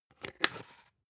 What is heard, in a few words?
spice jar big scratch close long; industrie wirtschaft; gastronomie